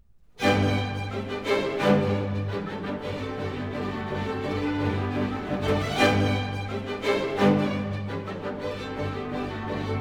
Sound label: ballet, Character Dances; Tchaikovsky; The Nutcracker (Complete Ballet); Act 2. Tableau 3. No. 12.; (Divertissement)